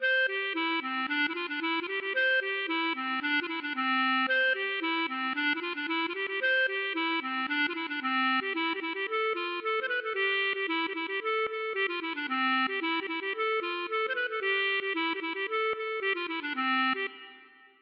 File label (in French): bourree